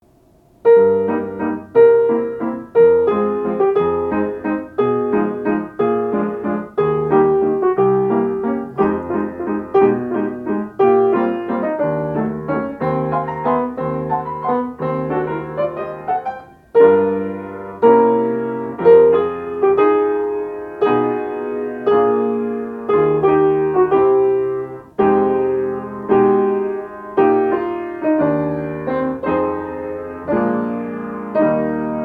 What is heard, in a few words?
melody